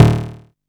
Perc 110, Drum & Bass, Percussion; AMG - Prototype Drum & Bass

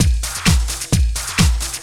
groove, DRUMLOOPS; Techno Trance; Ueberschall; Techno Trance Essentials

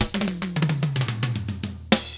SMBA, SAMBA; ILIO; Double Platinum Drums 1

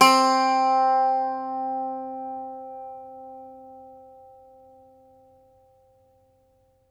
IRISH, Old World Instruments

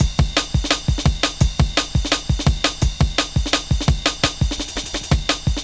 Kick Break, Drum & Bass; Fluid Dynamics

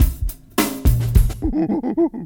FUNKY 106 bpm; FUNKY MONKEY; 90 s Sample CDs; Planet of the Breaks

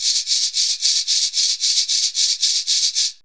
FAT SHAKER; DRUMS & PERC

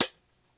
Closed Hat Real; Lost Tapes From Mars

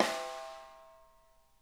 SNARE RIM; Sonic Reality; Interactive Drum Kits

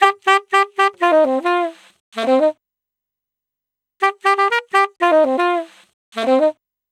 Sax 120bpm Eb, Saxaphone; Quazibeat; African Secret

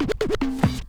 SCRATCHES; Scratch 1

